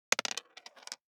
DICE on Plastic, Throw and Roll, Standard, 1 One Die; Samples; ArticulatedSounds; DICE Sfx Party (Extended)